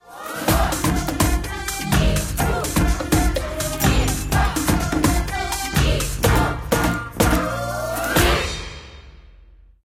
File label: Level completed